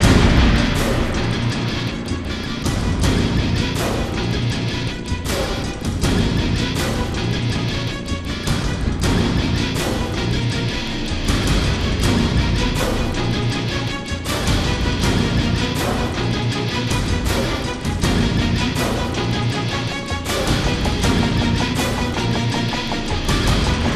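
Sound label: music, heavy loop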